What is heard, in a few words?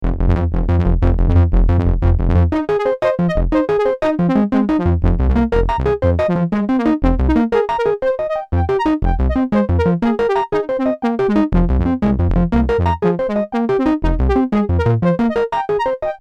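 Rubber Bites Arp, synthesizer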